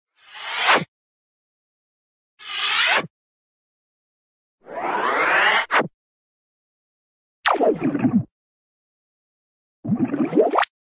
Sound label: ricochet tube arrive; cartoon